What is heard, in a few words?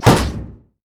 wolfman vs metal; LoL; warwick